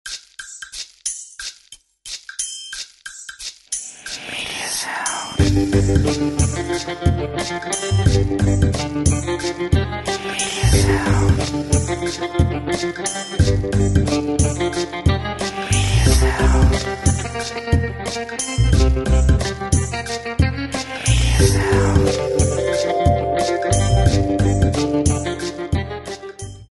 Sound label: Rat Trap - 90BPM, lounge swing